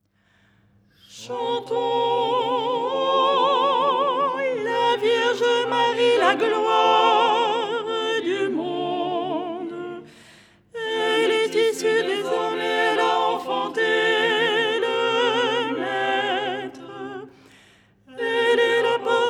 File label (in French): soprano